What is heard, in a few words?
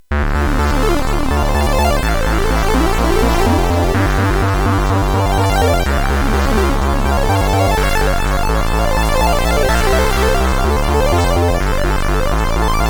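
MOS 8580; hvsc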